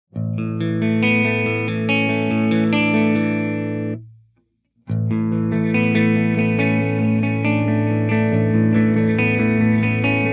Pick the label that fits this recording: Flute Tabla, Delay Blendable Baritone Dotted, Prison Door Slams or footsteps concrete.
Delay Blendable Baritone Dotted